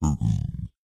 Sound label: zombie pig; mob; ayuncraft